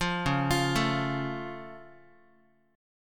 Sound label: C sharp Augmented Guitar; 8,9,8,7,x,x; arpeggiated; JGuitar